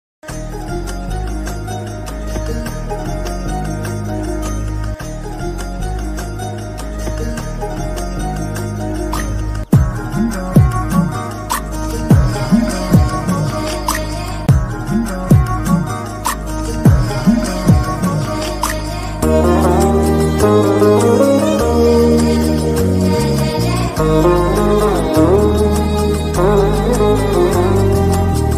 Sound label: Tamil cut songs ringtones